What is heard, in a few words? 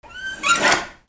RIPPING